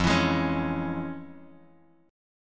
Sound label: F Minor Major 7th Sharp 5th, F, Bass Standard; strummed; JGuitar